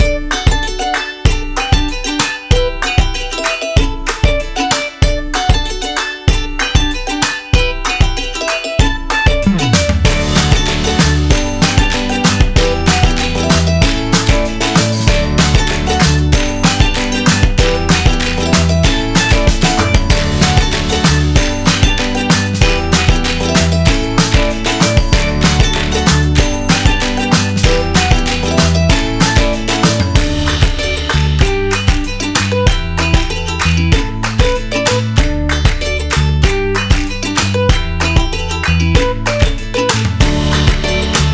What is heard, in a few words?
Bright Day Happy Optimistic Background; HAPPY/UPBEAT